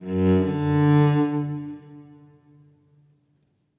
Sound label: Music Level Fight Stinger; Krish